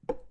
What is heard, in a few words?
egg hit